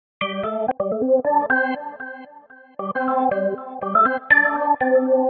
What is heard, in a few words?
organ